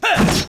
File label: High elf Archer attack